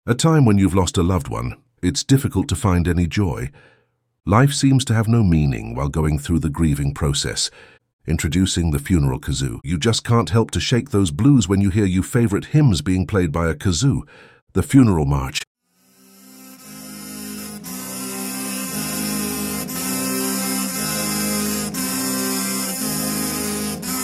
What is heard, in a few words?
funeral kazoo; classic gxo fake sponsors